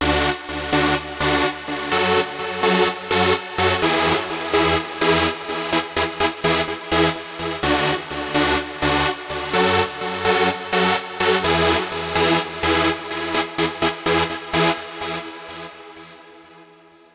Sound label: Lead, 126 BPM; Vengeance Samples; Vocal-Hook Kits; Song Kit